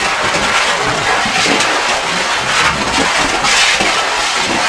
slide metal; terrains; Tony Hawk's Project 8; XBOX

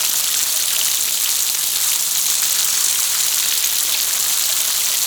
Amb, Scenery; Decors

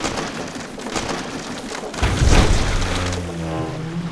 ship dismast; Sid Meiers Pirates; XBOX

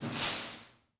spark; electrical sparks

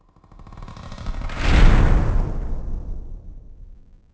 Mourn Gates Close; The Elder Scrolls III Morrowind GOTY; XBOX

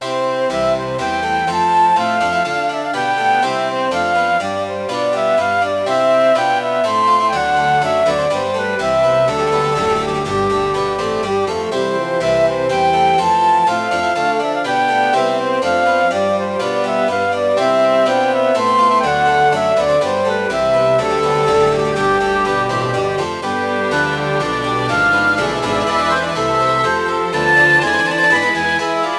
dance normal; Sid Meiers Pirates; XBOX

